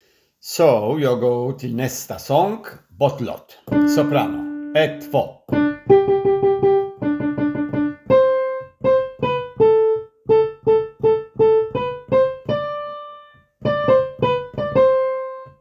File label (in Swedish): sopran